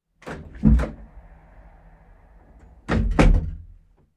bbc doors hou; RunningLateGame

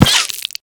Laser Impact Light; Sample Audio Pack; Free Pack

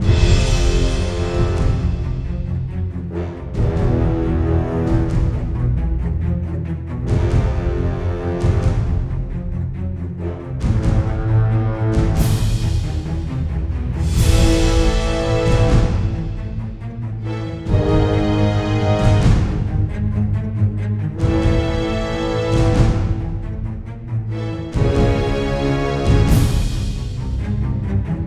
Battle Loop; 30s; Time for Battle; Sample Audio Pack; Free Fantasy Adventure Music Pack

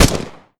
Hand Gun; Sample Audio Pack; Free Pack

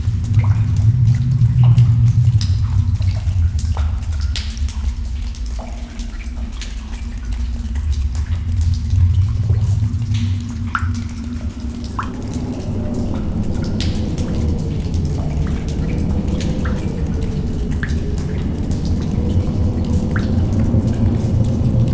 Cavern Atmosphere - Loop; Sample Audio Pack; Free Pack

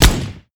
Hand Gun; Sample Audio Pack; Free Pack

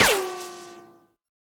Ricochet, bullets; Ricochets; Weapons of Choice FREE - Komposite Sound

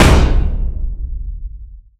Metal Impact; Sample Audio Pack; Free Pack

